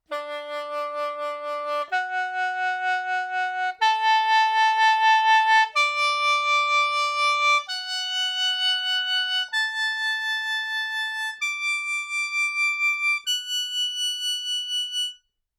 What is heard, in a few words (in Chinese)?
传统笙 演奏技法 腹颤音